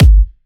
128BPM Kick; Vengeance Samples; Jamboo Kit

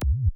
Roland E Blip, Roland S100; musicradar-synth-samples

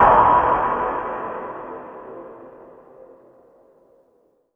Impacts

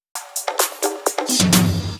Fill 128 BPM; Vengeance Samples; VEH1 Loops

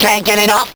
Vocal Phrases; Vengeance Samples; Vocals

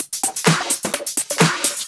Houseloops 128BPM; Vengeance Samples

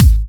Kicks; Vengeance Samples

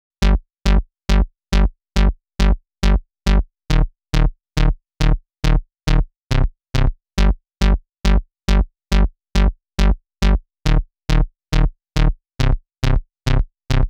Bassline 138BPM, Gminor; Vengeance Samples; VTS1 Outlaw Kit

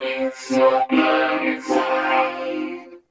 voc, Vocal House Samples vocal fx; PS-28 Vocal House Samples

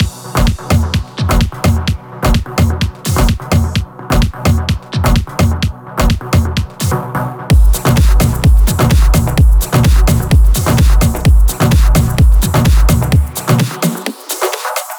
Mix, 128BPM; Jamboo Mix

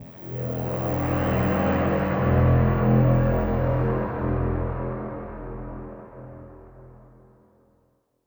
Pad; Ghosthack

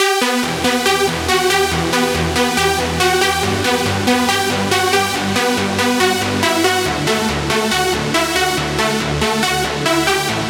Mainlead Outro 140BPM, Eminor; Unlooped; Vengeance Samples; Incast Kit

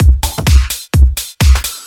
Housebeats, 128BPM; Vengeance Samples; VEH2 Loops

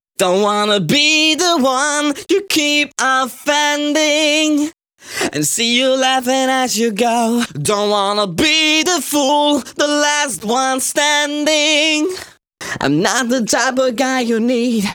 Pitched Vocals DRY, 128 BPM; Vengeance Samples; Vocal-Hook Kits; Song Kit

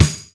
Snares; Vengeance Samples; VEH2

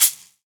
Shaker; Vengeance Samples; VEH1 Percussion

